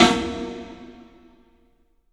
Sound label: SNAR, 3-D Percussives; E-MU Producer Series Vol. 5 – 3-D Audio Collection; P.A. Kit